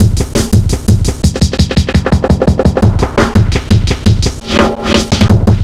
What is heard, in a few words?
block sweep, Drumloops 170bpm; Drum Bass; Zero-G; Total Drum Bass